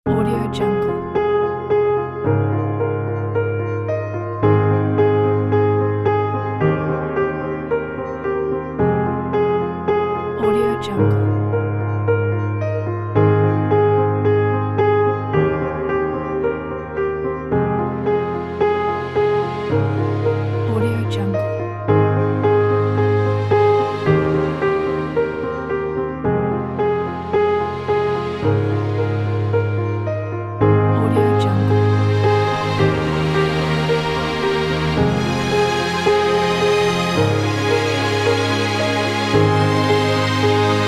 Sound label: the emotional piano; andromedasound